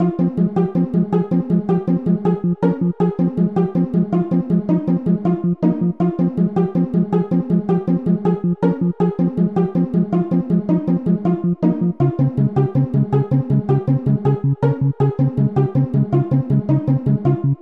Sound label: Volcano cave